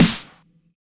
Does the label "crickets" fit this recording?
No